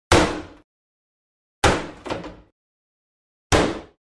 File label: Wood Impacts Heavy Single Hard Hit With Light Debris; Hollywood Edge Foley Sound Library